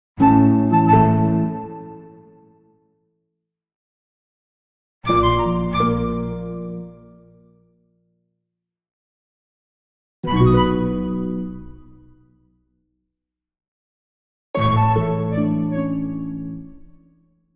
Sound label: Orchestral Jingle Neutral; boom library; The Interface